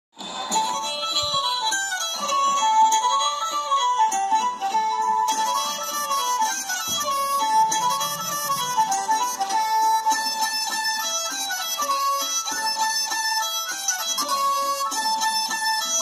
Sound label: unknown Irish tune, fiddle